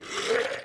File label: Knights Templar Gurgles; Playable Tutorial Level